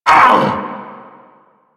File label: Vocal Pain; Warframe; Duviri; Dax; Vocal Set A